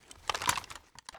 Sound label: rpk foley; garrysmod